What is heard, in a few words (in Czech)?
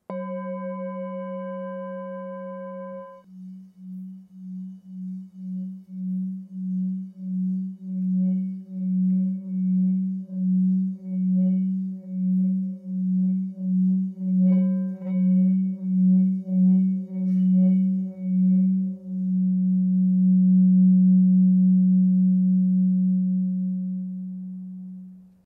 tibetska misa